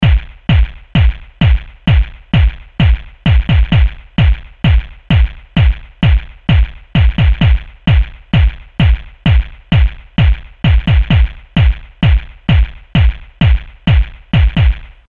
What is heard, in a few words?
Rattle Kick; Industrial